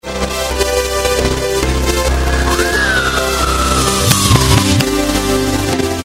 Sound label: Beep, horn